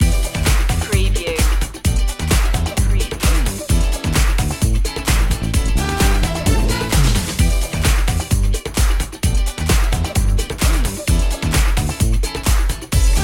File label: galaxy trumpet